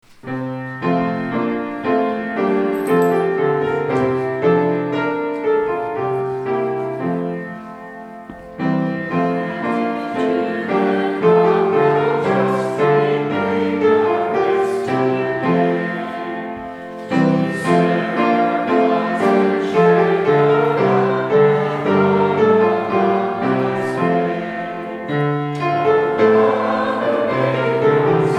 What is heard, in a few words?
Offering Hymn